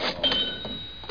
coins

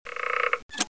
Pulls Lever; DR. BRAIN; Doc

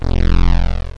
pulse got; Solar Wars

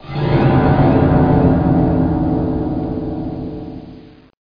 TENSION; thief

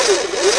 BAD zooming; PUZMANIA

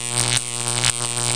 zapper; duke nukem total meltdown

